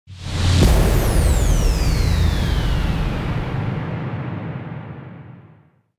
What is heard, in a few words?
IMPACT